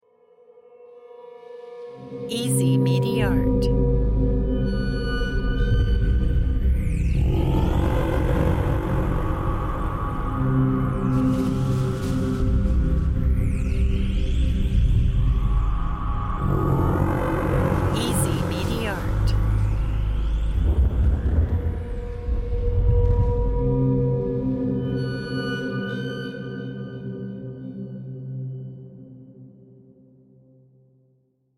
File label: cinematic background dream